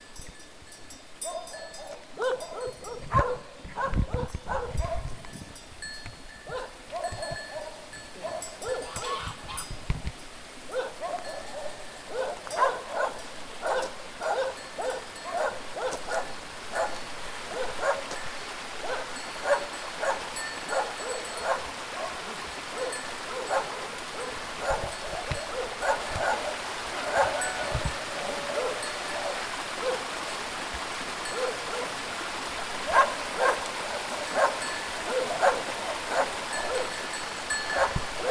nature small village dogs barking turkey rooster background; scapes